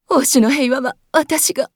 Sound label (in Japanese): マスケッティア女･死亡; オーシュマスケッティア